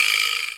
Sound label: Twist It Feedback